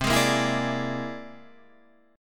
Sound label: C Augmented 9th